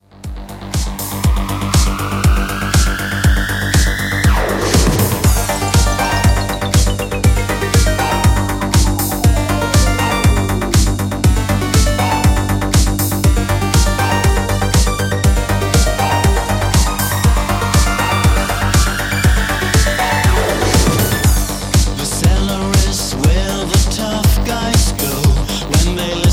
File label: Synth Pop Remix